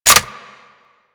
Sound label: Reload Dual Pistols; Grn Queen Guard Dual Pistols